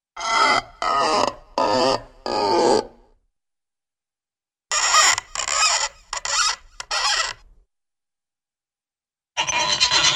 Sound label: Reverberant Wooden Creaks; Hollywood Edge Cartoon Trax